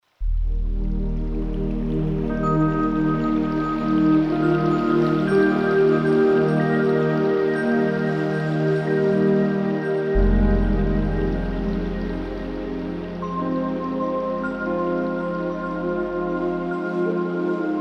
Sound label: Venus Meditation